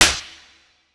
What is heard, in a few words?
Snare, Dubstep Snares; GhostHack